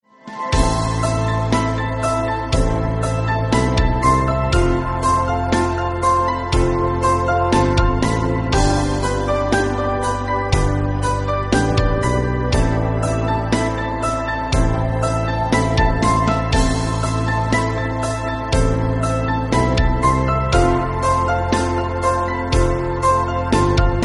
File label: melody